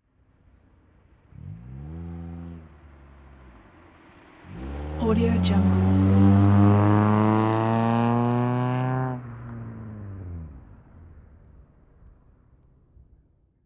street race car approach and speed away around corner